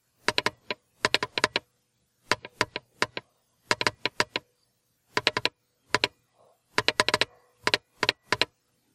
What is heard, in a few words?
morse